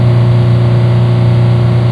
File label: train